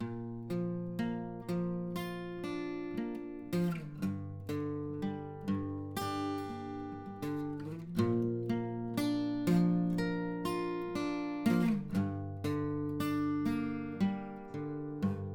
ballad Bb 120

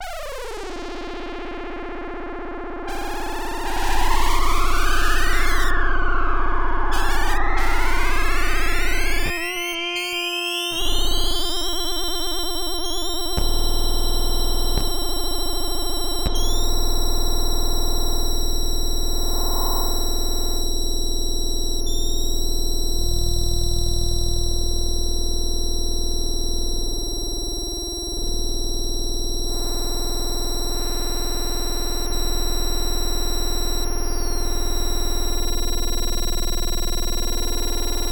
F drone

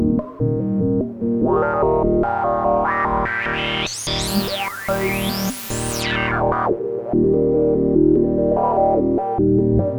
Eb pulse

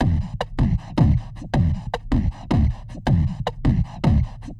beatbox

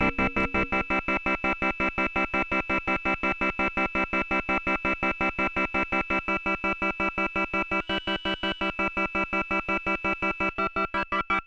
E pulse 86

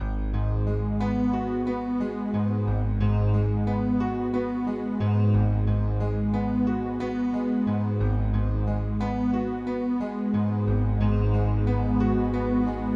arpegio B; chromaris

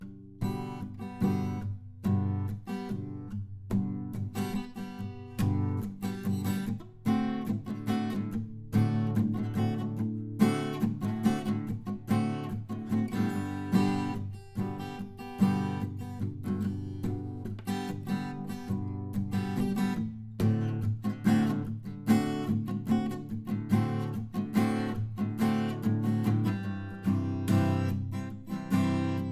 strum G 72

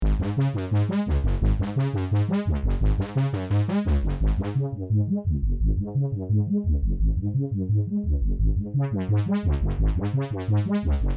bass arp ab 86

